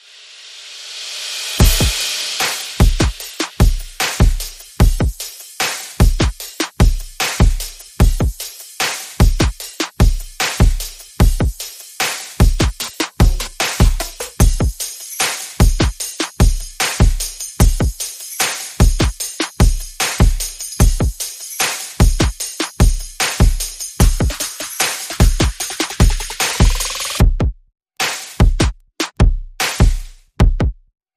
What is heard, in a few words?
Nice Moment, Perc and FX